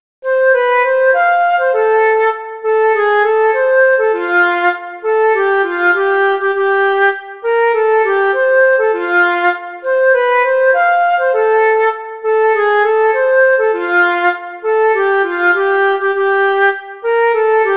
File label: flute solos